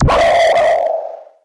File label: gunship ping search; hl2; combine gunship